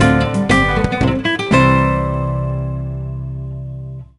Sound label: Jingle Normal; tamagotchi connection corner shop 2 ds; Hime